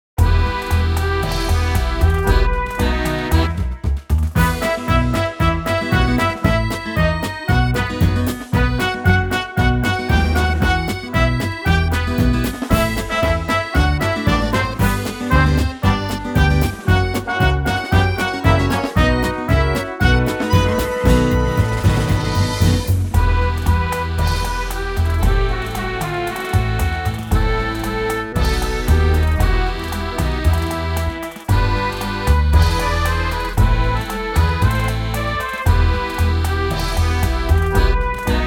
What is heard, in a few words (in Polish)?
akompaniament